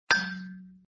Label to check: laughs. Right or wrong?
Wrong